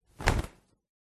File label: foley fabric nylon thin single flap